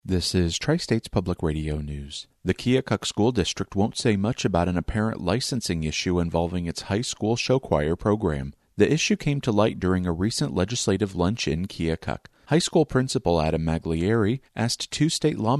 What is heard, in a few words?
SHOW CHOIR